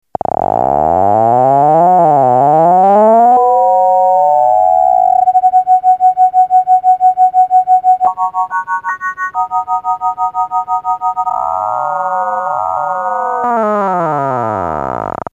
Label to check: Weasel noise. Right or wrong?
Wrong